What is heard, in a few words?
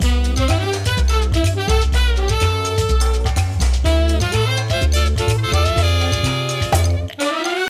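food world music paradise fight no guitar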